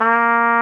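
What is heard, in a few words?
Big Band Fast, trumpet; Roland L-CDC-01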